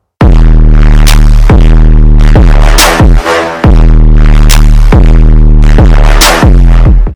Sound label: bass boosted drop